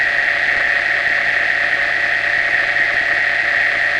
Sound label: fault, anomaly detection on sound data